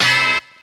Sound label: twanger